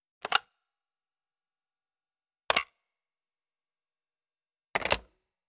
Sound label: Phone Pickup Hangup